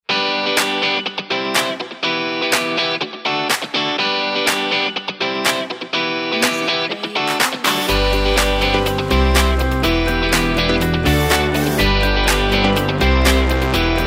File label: Upbeat Uplifting Positive Pop Rock